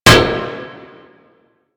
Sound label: Metal Pipe Drop; Ambience; Props; Warframe; NineteenNinetyNine